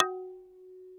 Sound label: F#3, Yamaha VP-1; Music Store; 90's Sample CDs; MIYABI